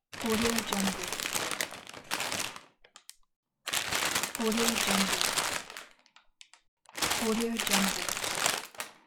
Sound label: paper bag